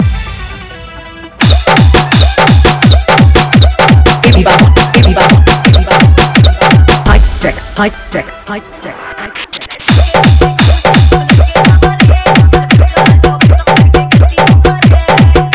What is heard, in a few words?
Dance Mixing Hi Fi Toing Bass Remix Bhojpuri Song; JBL